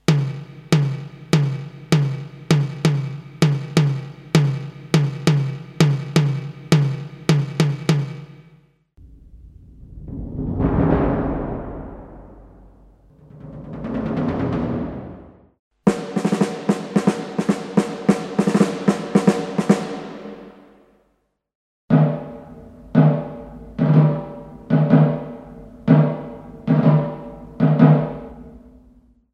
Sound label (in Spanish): pandero, bombo, caja y tambor